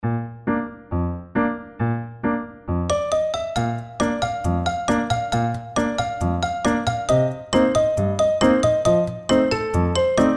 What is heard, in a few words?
Magic Item Shop; お店